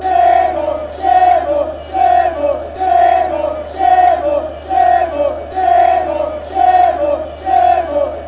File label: chants